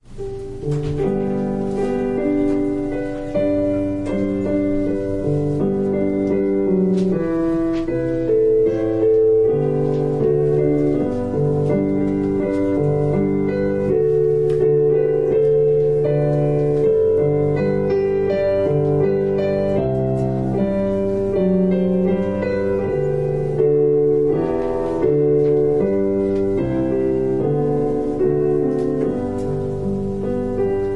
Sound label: Piano prelude